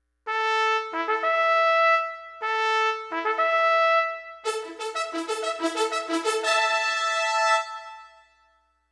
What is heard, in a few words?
Trumpet Flourish